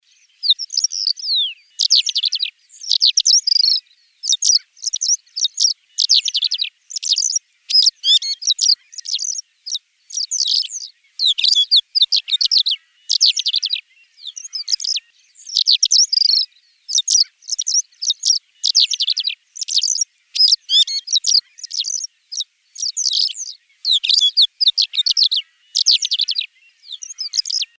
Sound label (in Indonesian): suara black lark